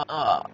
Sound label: uhhahh